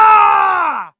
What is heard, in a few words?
voice sailor hit yell; arrgh-hoops